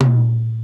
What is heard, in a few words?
626 MD TOM; Hollywood Edge; The Big Bad Beatmachine; TR-626